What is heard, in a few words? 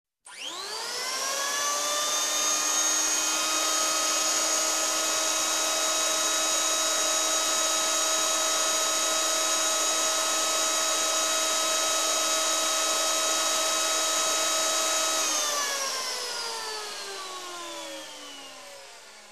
Machine Cutter Turn on and off